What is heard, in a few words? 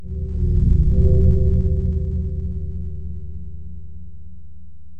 Ominous